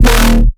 Bass Shot D; sickrate; siik essentials iv

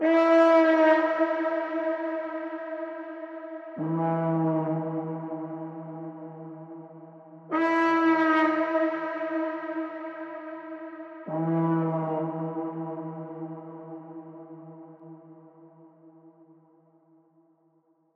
Scary Ambience E D 128BPM; sickrate; siik essentials iv

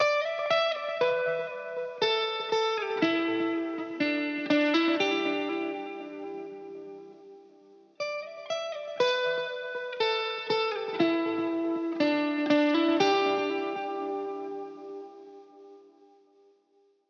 Guitar Topline Emin 120BPM Wet; sickrate; siik essentials iv

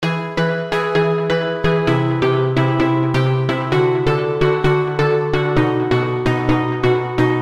Marimba Blend; sickrate; siik essentials iv

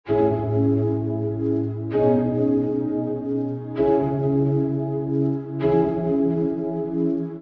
Spectral Piano; sickrate; siik essentials iv

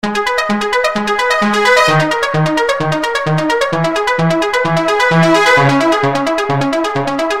ARP Hybrid Trombone; sickrate; siik essentials iv

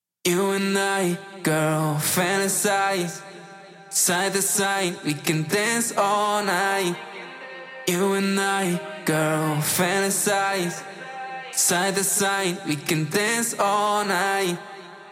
Melodic Vocal Phrase Cmin 126BPM Wet; sickrate; siik essentials iv

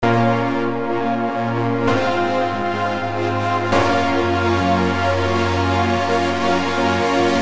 Smeared Chords; sickrate; siik essentials iv

